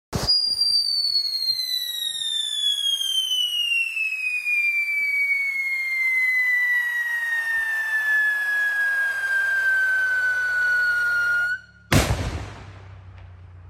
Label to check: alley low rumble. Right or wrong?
Wrong